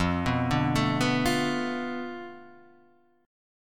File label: B Minor 7th Flat 5th F, guitar chord